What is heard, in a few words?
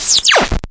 zap to crack, electro FX; UNUSUAL KITS and FX; Atomhard Electro FX